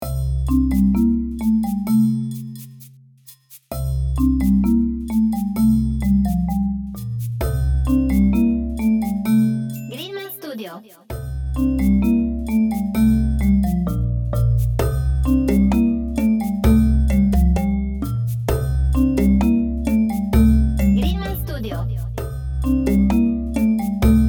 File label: Marimbas